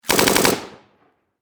Warfare Machine Gun 7.62 Caliber Bren LMG Short Burst Distant Perspective